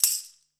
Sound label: tambourine; Zero-G; Total Drum Bass; Drums Percussion